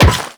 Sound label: Run Metal, Footsteps; Warframe; The New War; Characters; Kahl